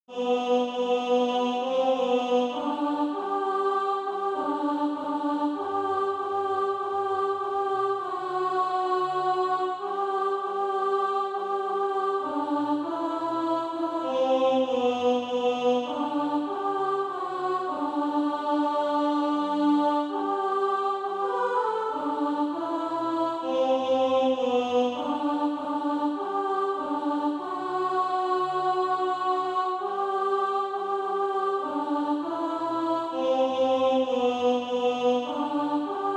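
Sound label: alto, SATB Practise Music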